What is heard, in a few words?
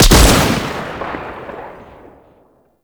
Pistol Alt Fire Shot; Weapons; Scaldra